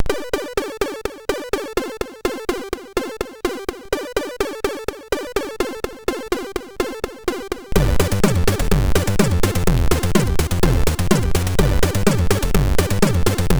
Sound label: Total Vibration, MOS6581R4